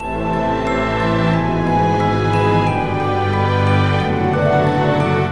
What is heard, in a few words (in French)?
orchestre